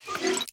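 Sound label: equip element; cstrike; valorant; neofrontier sheriff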